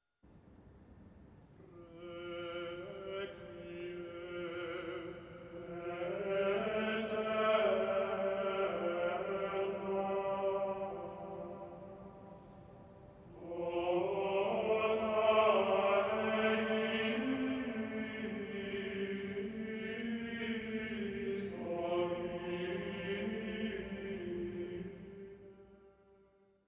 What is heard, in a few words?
plainchant